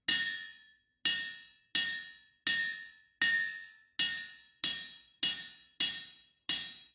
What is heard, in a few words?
ambience room forge working layer